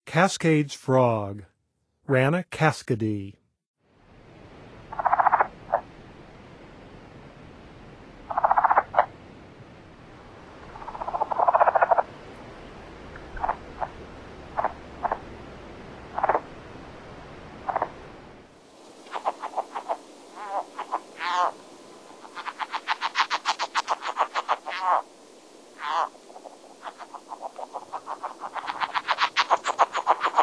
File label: Cascades Frog; N. America; Songs of Frogs & Toads of North America